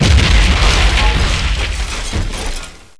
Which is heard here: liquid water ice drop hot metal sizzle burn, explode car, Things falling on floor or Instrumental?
explode car